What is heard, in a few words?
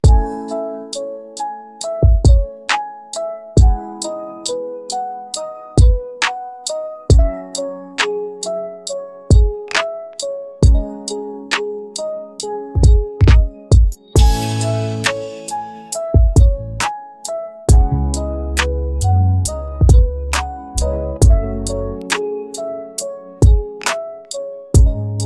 rainy lofi